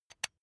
electric button drill direction switch forward soft